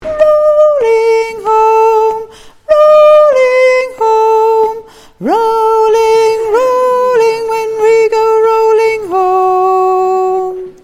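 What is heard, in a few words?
chorus soprano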